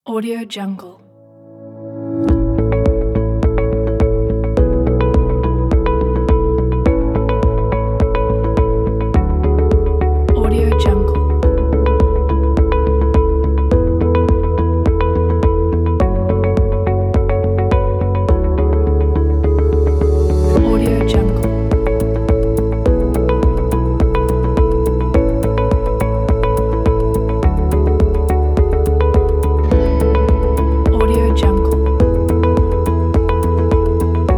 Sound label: corporate calm kit